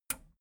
metal button radio release enable

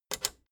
plastic button small latch click enable fast

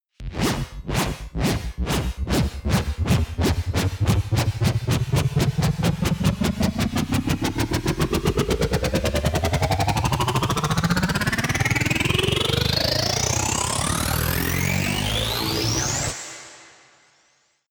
dubstep wobble bass low

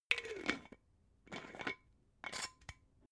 glass bottle massive close extra slow

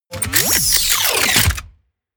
wind down

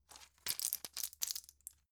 plastic blister handle

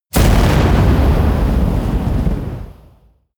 missile large fire stationary close

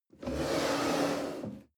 chair wood slide on floor medium

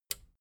plastic button small latch release

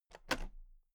toolbox latches open regular

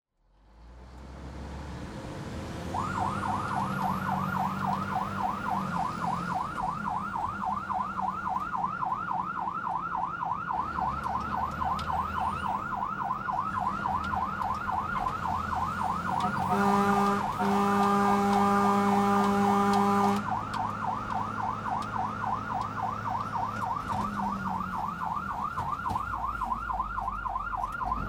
fire engine horn inside

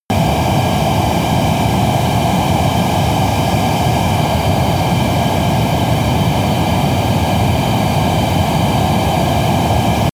military jet fighter turbine steady noise

